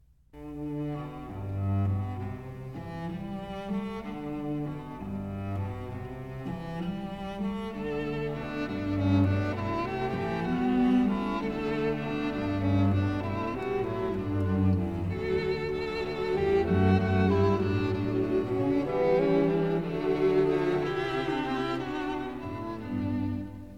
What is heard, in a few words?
instrumental; Bring a Torch, Jeanette, Isabella